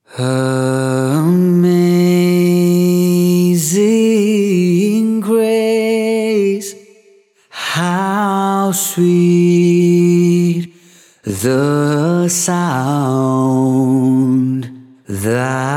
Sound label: amazing grace acappella